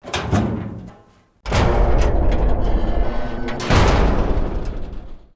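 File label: door hatch; doors; door lyuk kolhoz; FEAR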